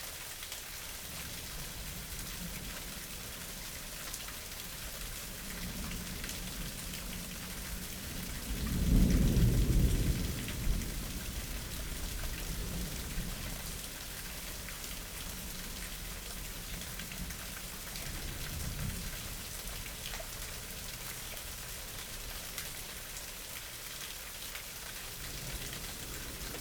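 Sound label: Spring thunderstorm in suburbs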